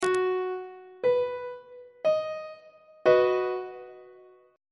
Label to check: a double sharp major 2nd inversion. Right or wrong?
Right